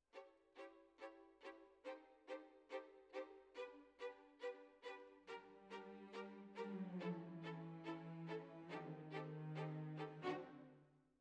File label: Violins, jazz for piano